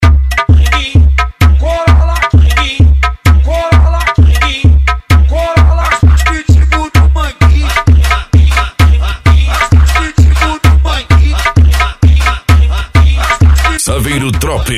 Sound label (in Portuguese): funk agressivo funk